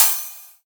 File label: present open hi hat one shot a key